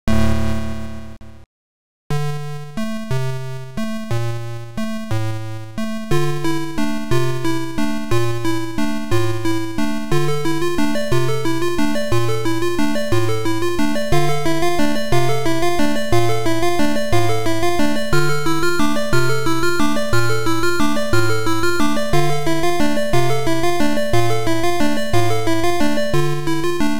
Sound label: xmas carol theme